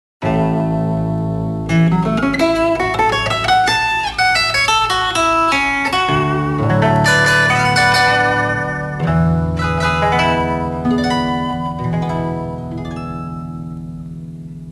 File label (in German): Walzer-Intermezzo